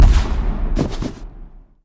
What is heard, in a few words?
potato mine; soundspvz